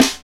SD Real, Roland; Sound & Vision - Gigapack I

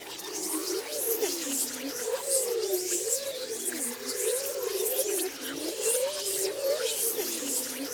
WHISP REV, whispers

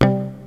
MUTED 5THS, ENSONIQ VFX; Rubber Chicken Software Sample Bank

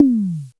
Tom Casio SA-1

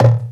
Dumbek FX Hit; Drum One Shots; Percussion; Ambient Grooves

